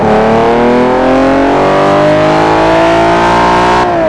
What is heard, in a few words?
second, vehicles; gmod; tdmcars; s60